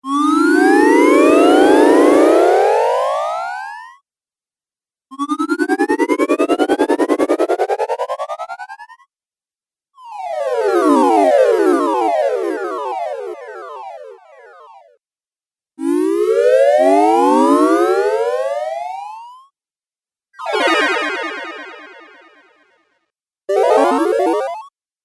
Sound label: Magic Fx Video Game Soundpack